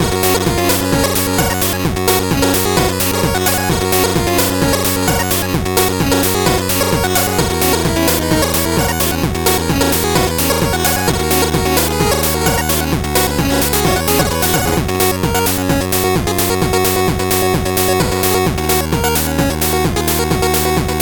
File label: 8bit bgm normal